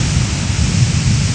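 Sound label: turbulence; wipeout2097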